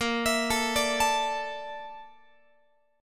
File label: A sharp Major 7th Flat 5th A sharp Guitar; arpeggiated